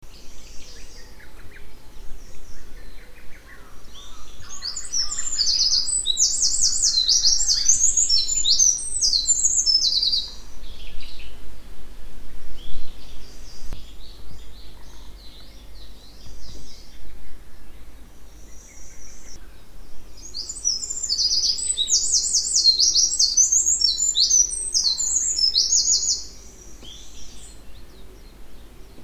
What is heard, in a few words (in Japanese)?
misosazai, miyakejima; mosuke